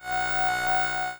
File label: trance lead